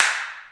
snappy clappy, Electronic Hits

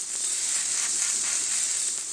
sizzling; LOOPING AMBIENCE

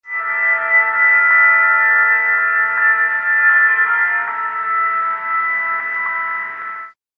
narrow thick chime pad; LOOPING AMBIENCE

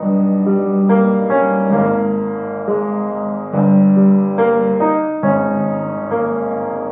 piano; work-sin-progress

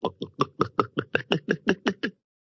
glug glug glug glug; Cartoon FX

